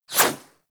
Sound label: CY Xbow Whoosh A; Tenno